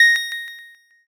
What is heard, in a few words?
ding